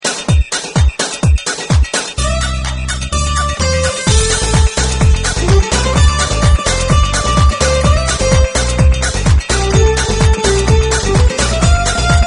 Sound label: SPANISH GUITAR CLUBMIX